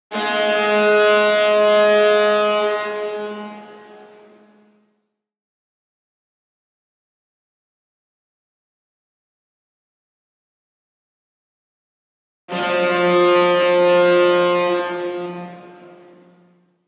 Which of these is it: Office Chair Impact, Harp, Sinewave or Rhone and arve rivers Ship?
Rhone and arve rivers Ship